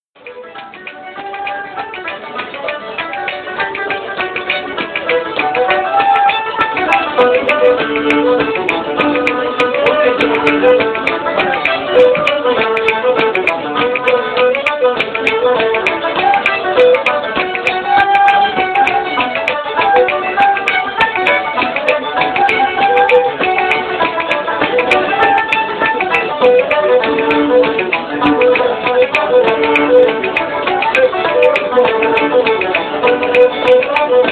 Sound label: Dingle Music